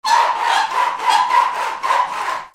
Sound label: FRICTION